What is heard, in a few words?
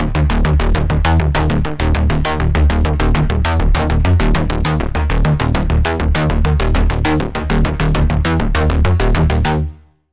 Pulse, Sylenth1; Pulse - Contrary; Routed Sound; Sylenth1 Expansion - Quantum Enforcer Vol.1